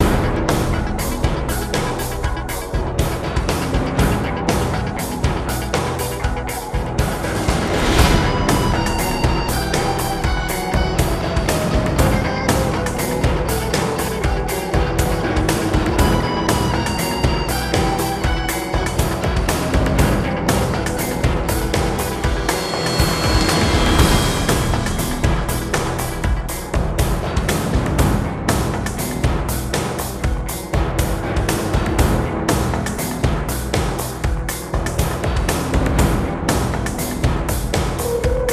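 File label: percussion loop; transformers war for cybertron gamerip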